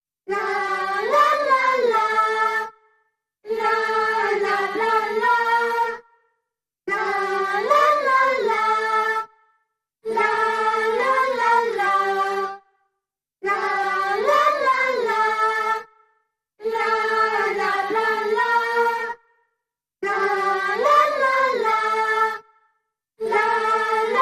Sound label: Creepy Circle, Choir Only